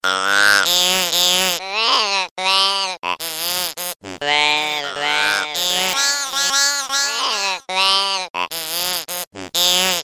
deer bleat

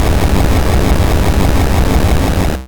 thunderbolt

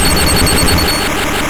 thundershock

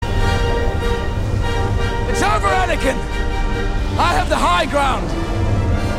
uh meow

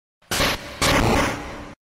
strength boulder sound effect; pokemon red blue yellow; pokemon rby

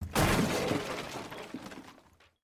quick barricade breach; rainbow six siege; barricade breaking

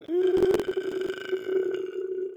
demon sound

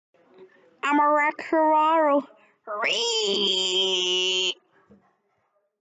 axolotl rehehe

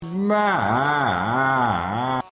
laugh

